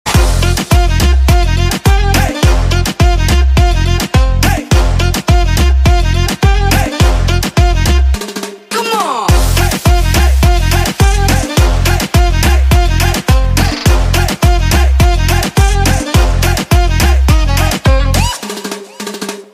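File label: Saxophone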